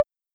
Tom Hi

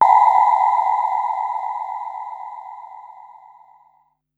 Noise Low, Korg Wavestation-SR Drum Kit

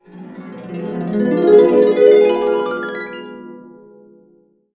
D DIM7 CA UP, CASCADE UP, Complete Harps; USB Soundscan vol.62; AKAI

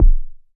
Elektron Monomachine, Drum Machines

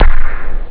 Gated Base Drum; ST-XX Amiga Soundtracker Sample Packs

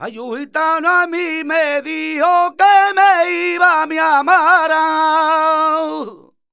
voc d#, Flamenco Vocals Male Cantaor

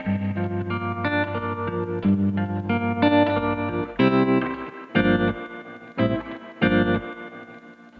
Trem Guitar, 70s Analog Funk

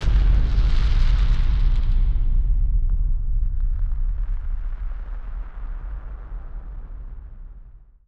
Firework FX; musicradar; bomb factory

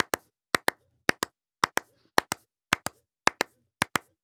African Vocal Clicks; Continental Drift Aftershocks; Vocals

